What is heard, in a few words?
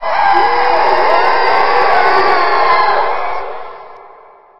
mega mob incoming; npc